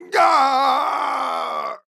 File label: Pain Death